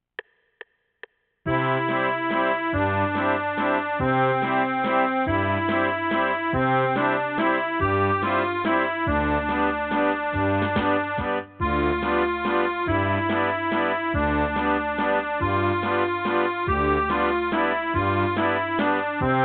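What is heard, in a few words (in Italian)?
Basso alternato, Fisarmonica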